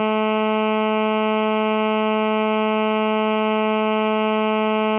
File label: GSM Mono